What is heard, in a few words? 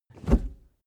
suv pull back gear straight fast hard; verkehr transport; autos